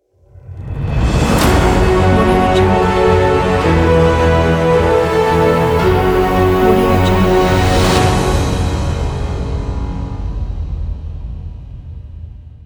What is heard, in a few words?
epic action news logo